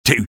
sniper taunt rps exert

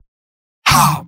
pain sharp; spy; mvm

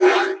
throwable grunt; weapons; breadmonster

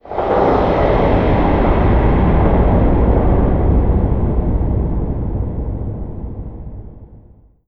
spacebase rumble; Garry's Mod; tf2

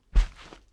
step; lirili larila

